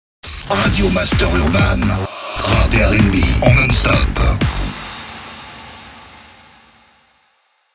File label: JINGLE RADIO; RADIO MASTER URBAN; Habillage